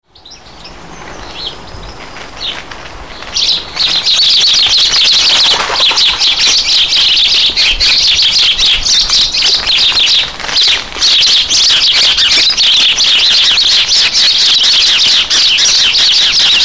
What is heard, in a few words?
sparrow fight